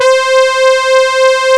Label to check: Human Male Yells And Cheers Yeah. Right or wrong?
Wrong